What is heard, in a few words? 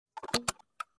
Shotgun Load; Firearms; Zomboid